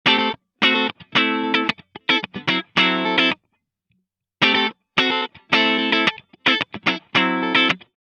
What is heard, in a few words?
Guitar No Effect